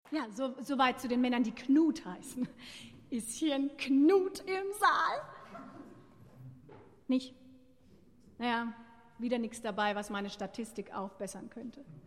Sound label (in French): Chanson